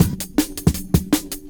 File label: Pitched 160, Classics Breaks; Drum&Bass; EZRollers